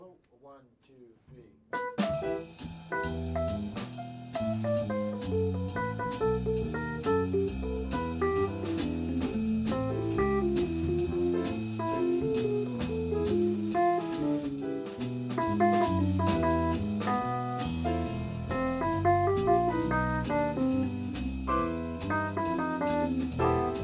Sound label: jazz Solo